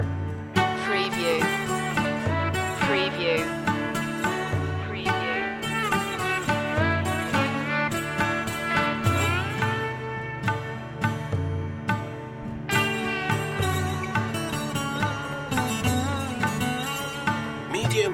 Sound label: bombay blues loop